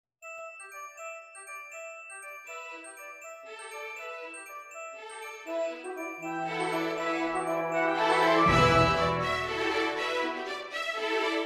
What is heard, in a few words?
Fanfare